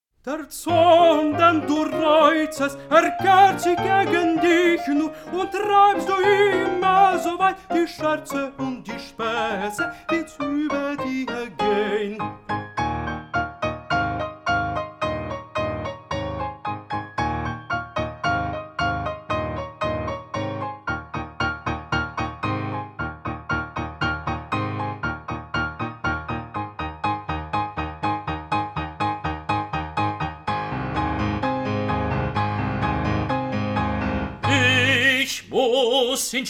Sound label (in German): auf zur feier lasst uns jubeln instrumental; rigoletto; Bregenz